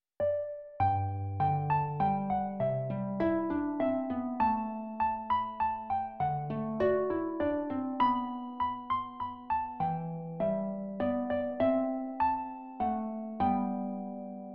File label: Carols for Solo Harp